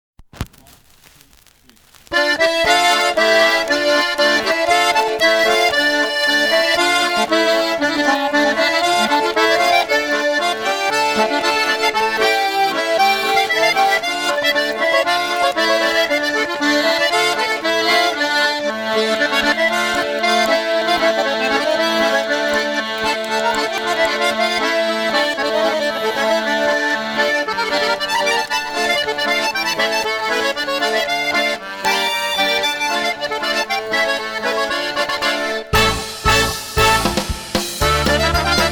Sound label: Polka